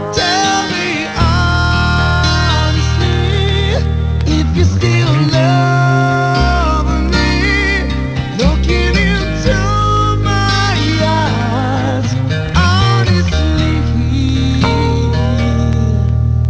acoustic

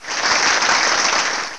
applause

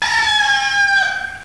rooster

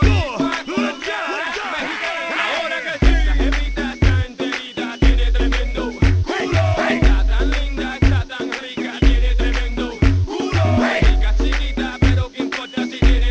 hiphop

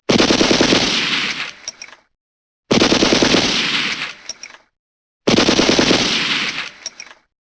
shooting

